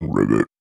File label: Frog Ribbit Deep Fr; animals wild frogs